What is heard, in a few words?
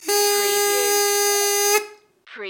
Party Horn